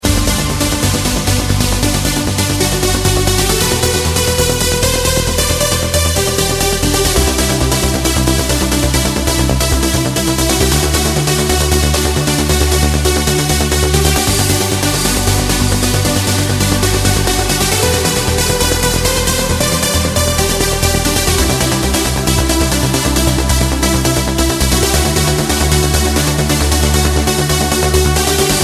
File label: Trance